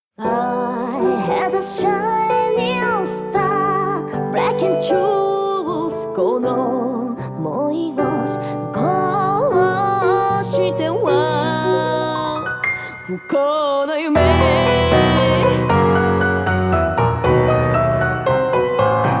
Acoustic ver

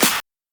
Snare, PsyTrance

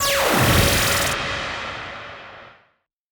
Zap, PsyTrance

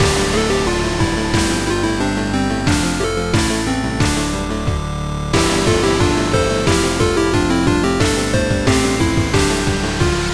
short chiptune

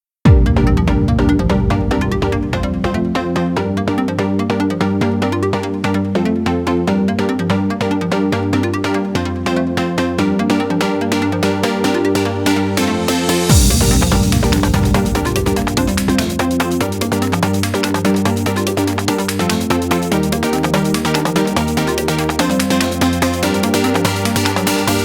Instrumental; 新潟東方祭12

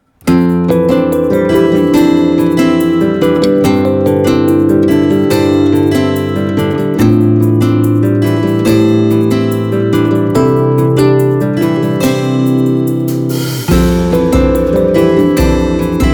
acoustic ver.

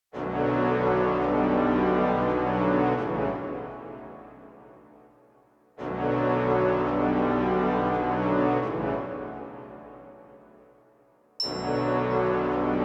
instrumental

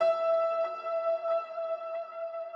piano

